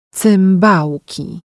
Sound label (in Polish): cymbalki